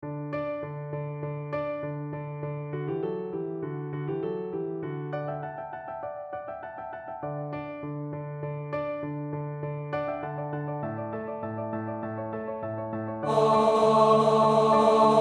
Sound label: Tenor; Quoniam tu